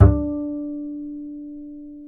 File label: D 4, bass, acoustic fx; Spectrasonics; Bass Legends